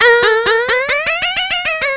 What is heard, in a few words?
CAROUSEL, Funky Vocals; USB Soundscan vol.18